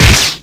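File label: Battle Rapier Critical; Etrian Odyssey III HD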